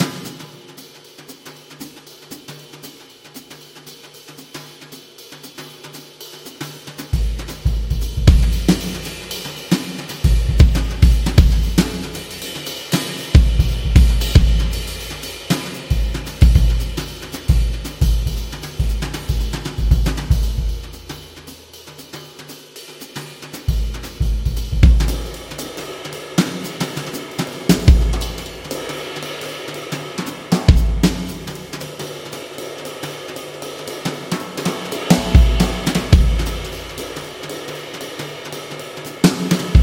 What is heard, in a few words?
Jazz Drum Solo